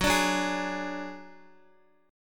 Gb Suspended 2nd Sharp 5th, Guitar; strummed; x,x,6,7,6,4; 4F,4A,5C,5G,5C,6E

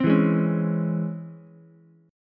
C sharp Minor Major 7th C sharp Ukulele; C#mM7 strummed; JGuitar

